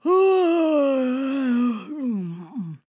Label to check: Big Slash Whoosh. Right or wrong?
Wrong